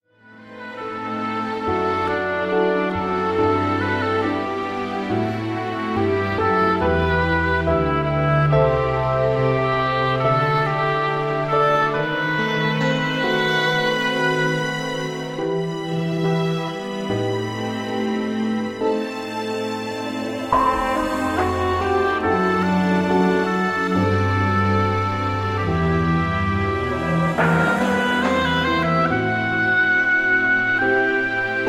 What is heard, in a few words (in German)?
Zwischenmusik